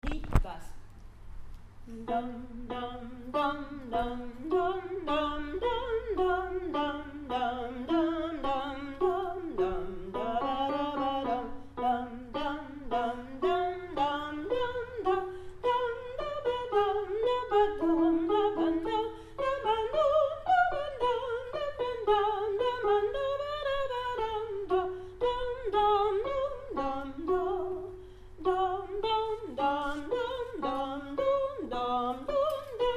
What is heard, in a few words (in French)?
Badinerie alti; Badinerie - J.S. Bach; alti entier (mes 30 a revoir )